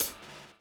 DOWN, DOWN KIT 78

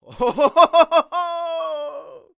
LAUGH, ANDES; World Voices; ANDES FX; Spectrasonics Vocal Planet

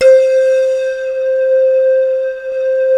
MALLET SYN C3; Greytsounds VOL-1; VFX

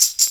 TAMB; InVision Interactive; Percussion Slam

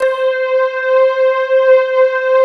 MARIM PAD, JV PADS; Pro-Rec - Synthsonic

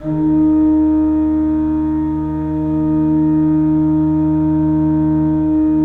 3D Organs Pipe Mix Celeste

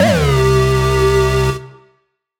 Siren Horn down, Ramp Down, C; future rave

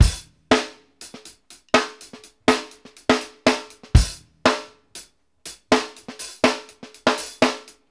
KICK ON 1, drumworks; Sampleheads; New York City Drumworks VOL-1; KD JBEAT 120

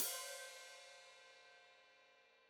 Hat Foot Open, Hi-Hat; Deeply Sampled Drums

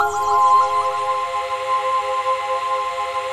ATMO PAD; 90 s Sample CDs; Best Service; Dance Mega Jungle Rave

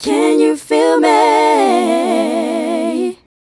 Female Vocal; Sonic Foundry (Sony Creative Software); Mac Money

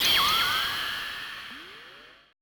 AMBI FX

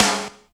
SNR, Tekno Industrial; East West

